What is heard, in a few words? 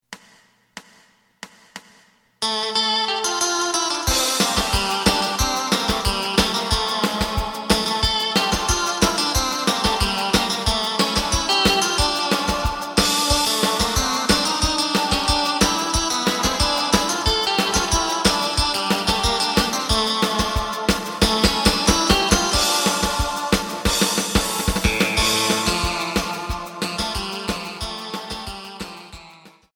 bass